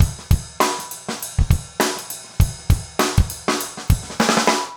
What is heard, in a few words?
basic funk 100